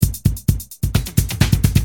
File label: Tight, Drums Perc; Industrial